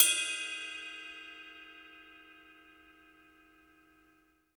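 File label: CYM RIDE, ride cymbal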